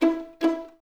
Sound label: Violins E4 Pizz, String Ensembles; Miroslav Vitous; 11 Violins